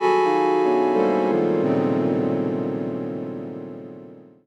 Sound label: Deadly Chord